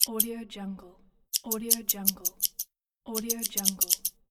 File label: scissors cutting